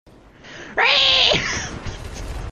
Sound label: Reee